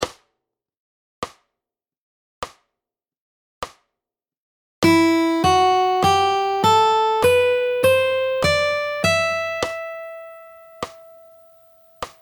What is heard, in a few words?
Fingerstyle Scale Up; Fingerstyle Fun; Getting Started